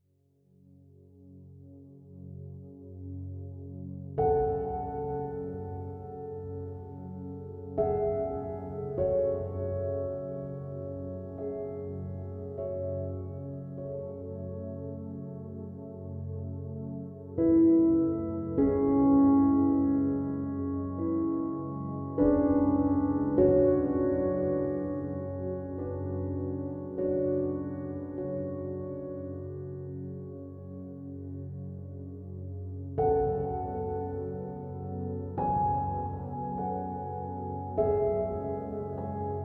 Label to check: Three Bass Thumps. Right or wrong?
Wrong